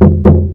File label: wooden bridge; SuperTuxKart